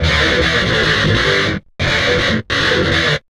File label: GTR LOOPS